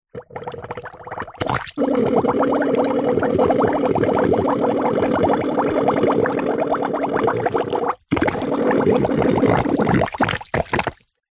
leisure whoopee cushion air release shallow water bubbles hiss fart long strained